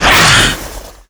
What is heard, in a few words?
attack, antlion; creatures; vox